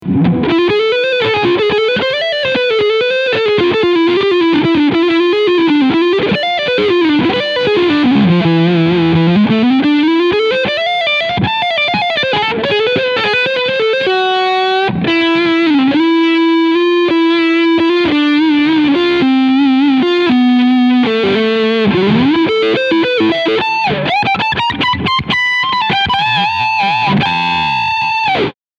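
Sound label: Framus Diablo II Supreme Leadsound Front Pickup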